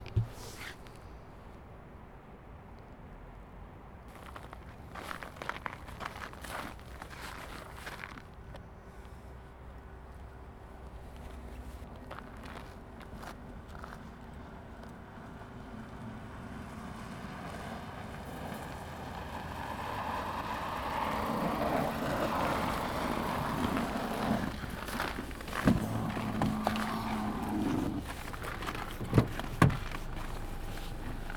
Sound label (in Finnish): taksi tulee sisäänajoa